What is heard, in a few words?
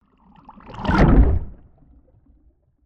Cinematic underwater whoosh